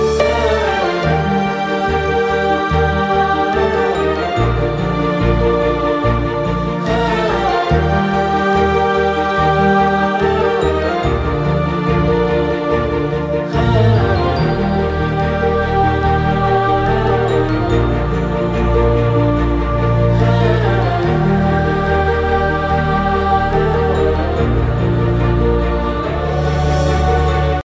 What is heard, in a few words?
Tamil Ringtone